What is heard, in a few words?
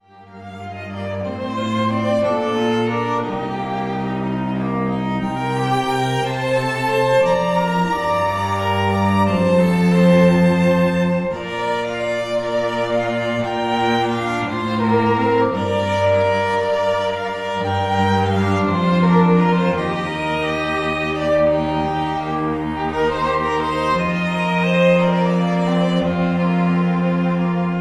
String Trio; Wedding Music; Ann Arbor, MI; White Pines Entertainment